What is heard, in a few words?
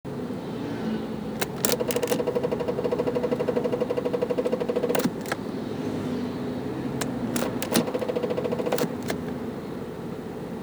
Alfa 156 engaging gear